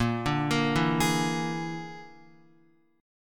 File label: Bb 7th Flat 5th Bb, Guitar